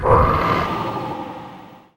wolf geist; weapons; dota; lycan

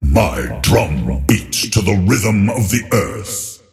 arcana drum; dota; earthshaker

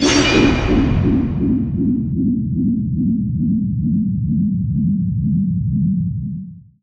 magnet cast; spirit breaker

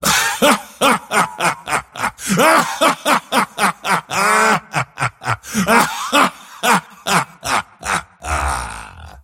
mars laugh; dota

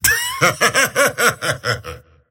laugh; slyrak; dota; dragon knight

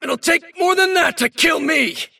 attack pain; davion; dota

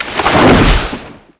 treant death; footsteps; furion

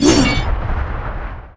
charge, movement; spirit breaker

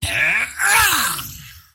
toy pug attack emote; dota; pudge

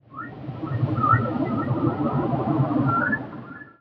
cetaceans; ambient soundscapes; dota

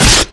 attack; dota; pudge

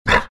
grunt, greevil; courier

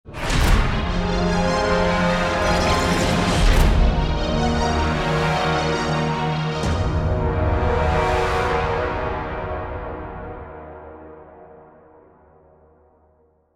lose; radiant

